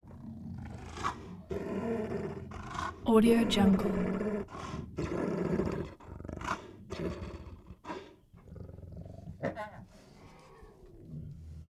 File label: beast voice growls snarl aggressive wet